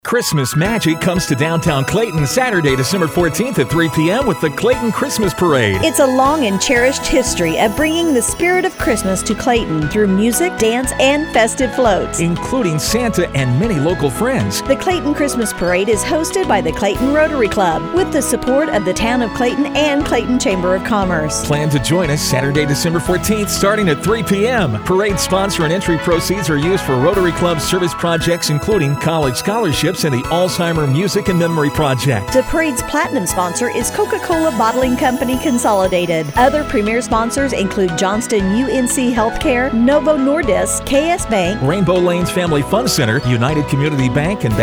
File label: Parade Christmas Magic; Clayton Rotary